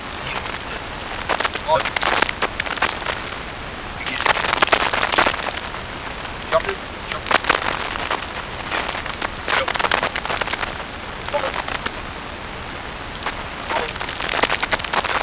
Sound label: Radio Emit Loop; zps; Humans